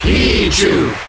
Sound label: Cheer International; Pichu; SSBM